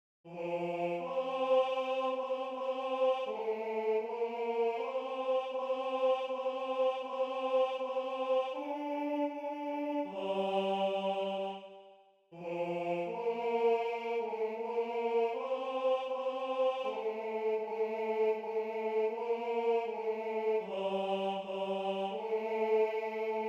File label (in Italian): Tenore; Base tenore